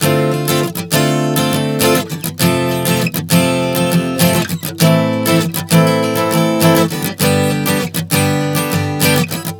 Dm-F-Am-G 100 bpm, acoustic guitar chord progressions